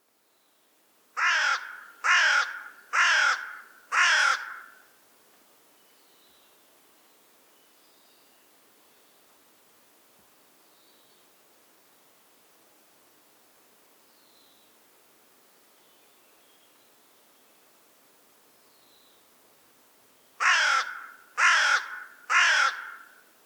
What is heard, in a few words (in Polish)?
czarnowron Corvus corone